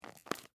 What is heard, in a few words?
Cigarette Box Open, Foley, Cigarette, Box, Open, Lid; Smoking Sound Effects; BlastWave